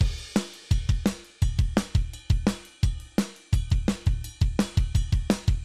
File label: Dr Mix dry